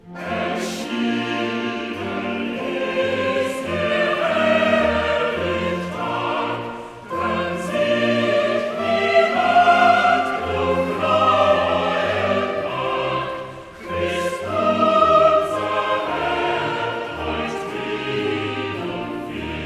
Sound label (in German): Choral; Cantata, BWV 67 'Halt im Gedächtnis Jesum Christ'; 'Erschienen ist der herrlich Tag'; Bach; Arnstadt; 1st Sun After Easter